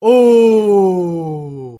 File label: "ooh"; Voice